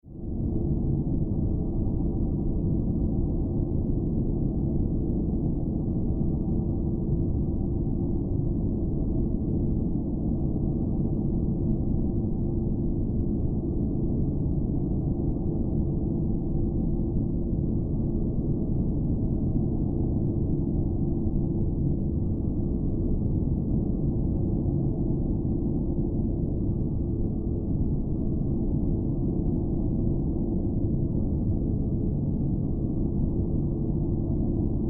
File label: Drone SciFi Tonal Spacecraft Interior Ambience Rumble; Atmosphere Science Fiction